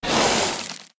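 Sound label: spider vocal attack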